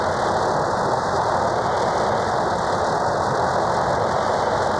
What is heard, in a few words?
Cracklature, sparse soundscape